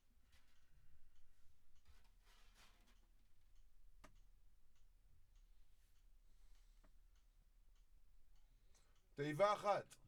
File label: Drums